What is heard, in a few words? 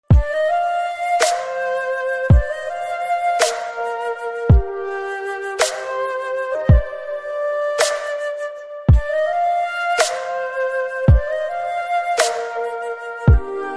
BGM Ringtone